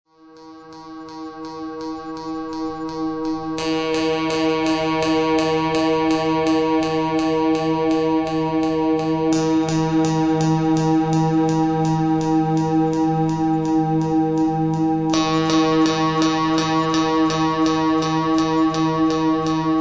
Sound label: AMBIENT EXPERIMENTAL